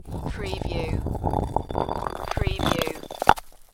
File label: Medium Rock Slide - Scrape Then Impact